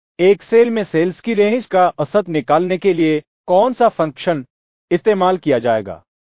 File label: techno